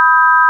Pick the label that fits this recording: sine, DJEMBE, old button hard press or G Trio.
sine